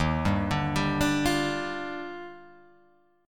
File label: D sharp Major 9th, Guitar Open G